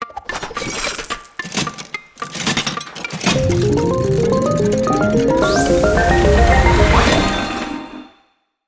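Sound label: gacha machine full sequence; Just Dance 2019; 2018 switch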